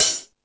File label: High Hat, Drum Machines E-MU Pro-Cussion